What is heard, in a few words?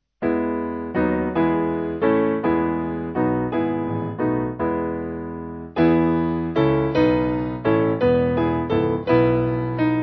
Of The Fathers, Divinum Mysterium, Piano